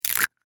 Whip Cream Can, Household, Whip, Cream, Can, Plastic, Seal, Open; Food; BlastWave